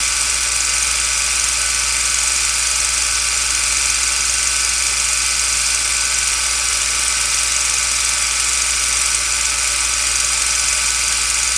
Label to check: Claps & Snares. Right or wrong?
Wrong